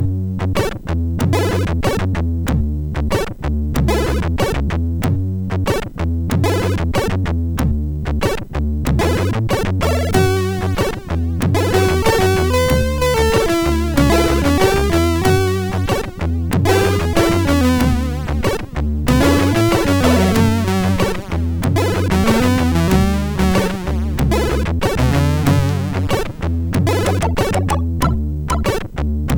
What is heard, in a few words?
Groove, MOS6581R4; Groove v2